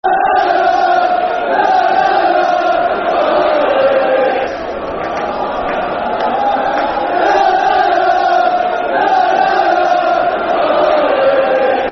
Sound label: Lala Alex, Football Chant (Soccer Songs); Crewe Alexandra Cafc